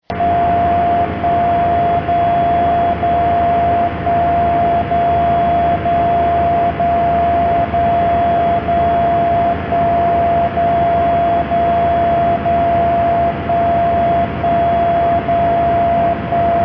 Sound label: dcf77, digital modes